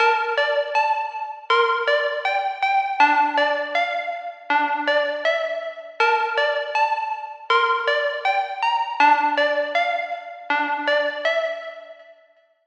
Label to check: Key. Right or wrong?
Right